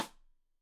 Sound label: Side Stick Stereo; Deeply Sampled Drums; SMDrum